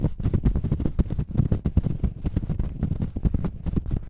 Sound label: snowball roll